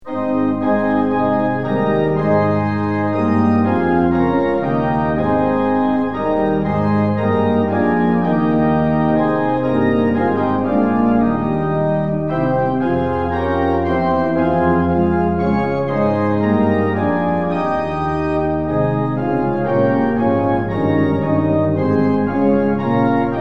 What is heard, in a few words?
tune; hymns; lillenas